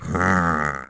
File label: zombie